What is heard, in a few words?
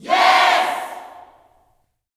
YES, Vocal Planet Gospel YELL SHOUT; Spectrasonics; Vocal Planet Vol.1 Gospel; akai